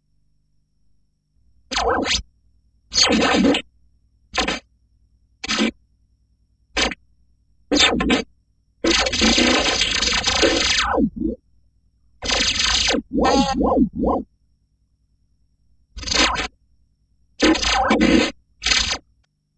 video zaps

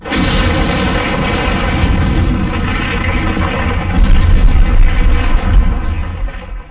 explosion

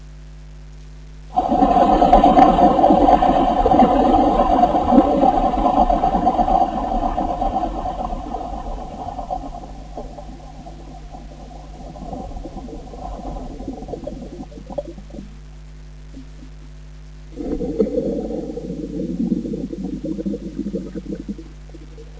fire ex

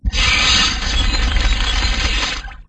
big zap

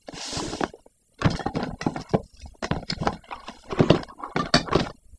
box rummage

